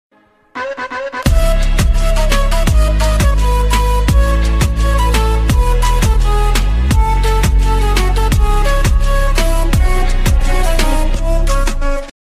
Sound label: horn music ringtone; pubg